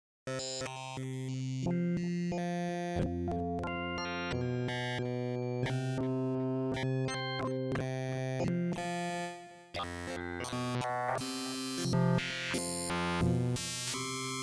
waveswitch polka-esque

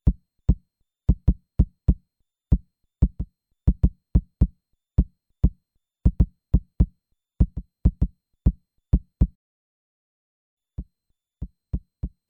drum; drumport; 1 Pickup 2 Mic

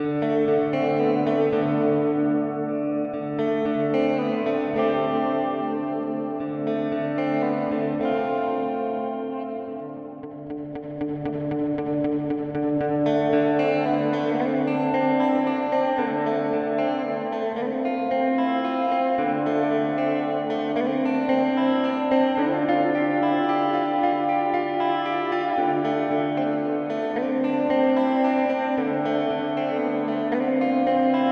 Golden Reverberator Spring

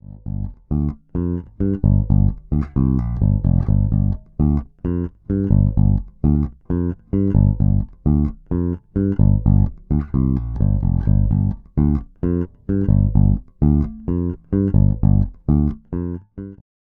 Fodera Monarch Standard Special Both Pickups

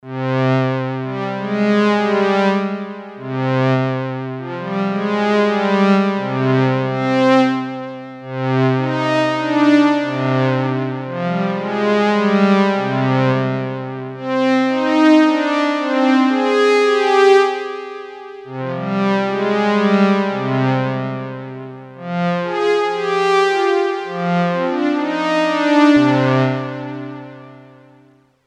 Roland JX03 Violin

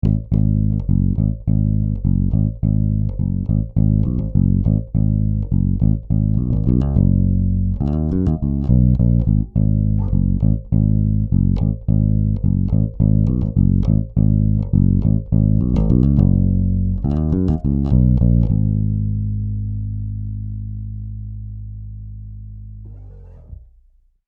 Sansamp Bassdriver DI bypass; Sonic Farm 2di4

